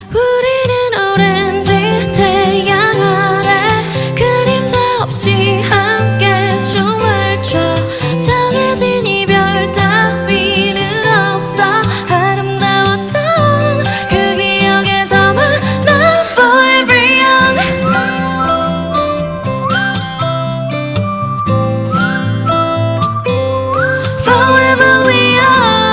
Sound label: acoustic ver ringtone